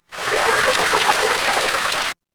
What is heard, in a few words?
water stream